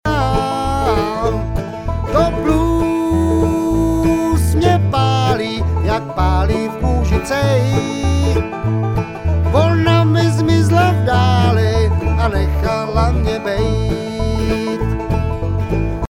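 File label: vocal Lead, bluegrass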